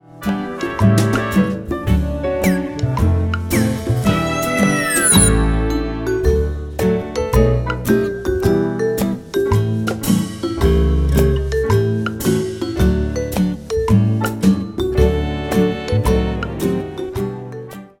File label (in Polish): instr; Odkrywcy muzyki nowe przygody